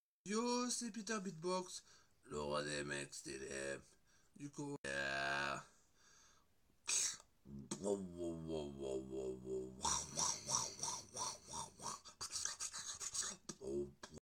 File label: beatbox